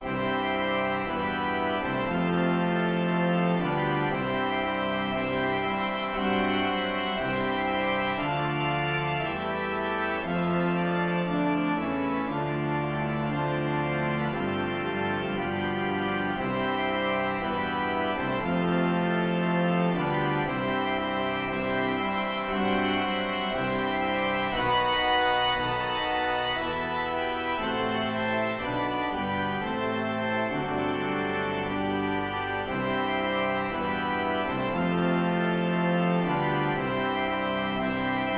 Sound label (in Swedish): Brollopsmarsch